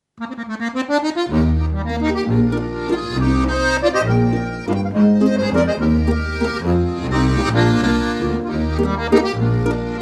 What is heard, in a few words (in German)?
Walzer